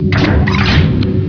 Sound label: lever; space ghost; moltars lever